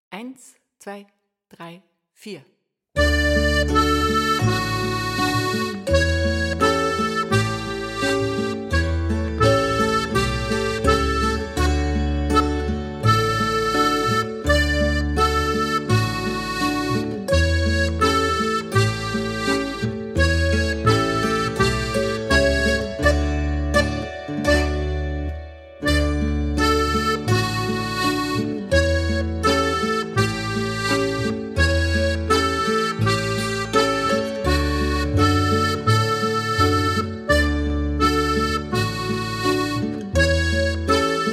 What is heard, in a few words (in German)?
Advent Boarischer